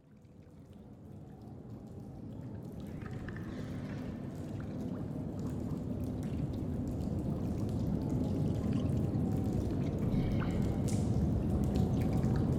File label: amb cave; ambiance; environment track; caves